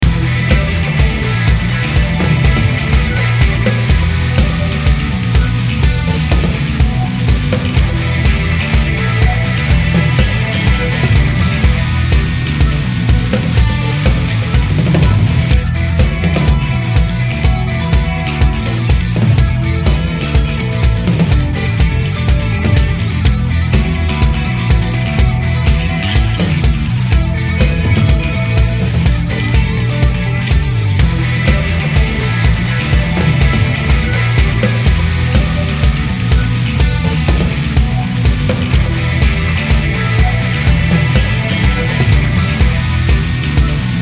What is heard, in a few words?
Strike Menu; Over G Fighters; Xbox 360